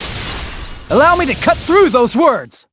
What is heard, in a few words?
cross swords; Hajime